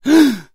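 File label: sounds of unpleasant shock in a person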